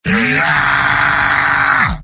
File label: battlecry; heavy; video games; source engine; tf; mvm